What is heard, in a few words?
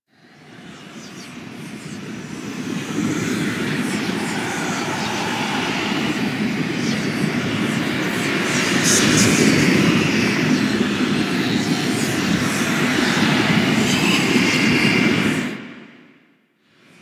Reliquary Amb; Warframe; Rail Jack